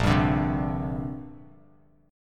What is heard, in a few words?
A sharp 6th Add 9th, Bass Standard; strummed; JGuitar